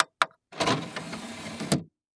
Technology Electronic Machine Wood CD Player Ejecting CD With Buttons